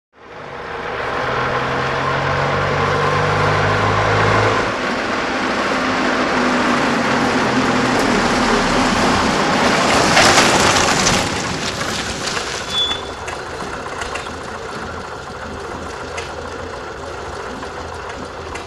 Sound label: Stop Off Old Citroen, Automobile; In / Stop / Off; Old Citroen; Up And Stop With Light Brake Squeak, Idling And Switch